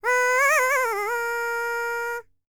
BULGARIAN, World Voices, BALKAN

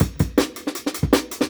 160, Jungle Breaks; Original Jungle Breaks

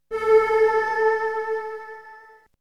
Synth; ZERO G - Datafile DISC 1

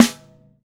TY Snare, Roland BK-5; Snares

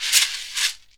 SHEKERE; ILIO; Synclavier Percussion 2; World & Orchestral

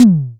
Tom Short, Tama TS-206

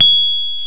PNO, Rhodes Mk.V; Keyboards of The 60's and 70's

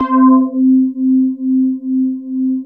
SUB HUM C5; Greytsounds; Scott Peers Sound Engineering

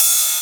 Rides, Cymbals

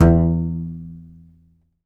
C. Bass Balalaika, Plucked Strings

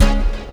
hit, brass

